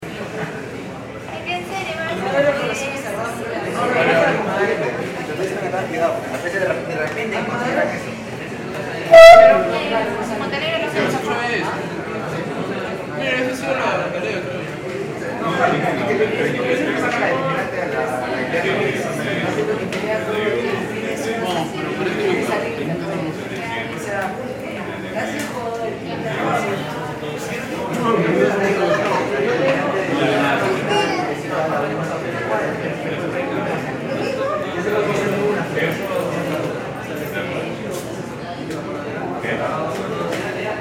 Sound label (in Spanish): CAFETERIA DE ESTUDIANTES INTERIOR